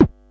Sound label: silly elec fx